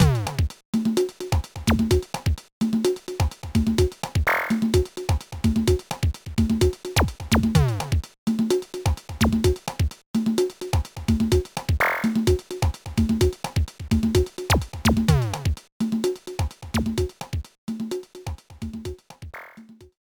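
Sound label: background music; No No No Puzzle Chailien; GBA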